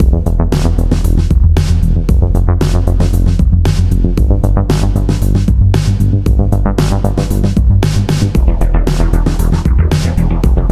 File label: battle; ufoai; dynamic test